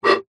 air blow inside small tube pipe short burst recorded at opening; zapsplat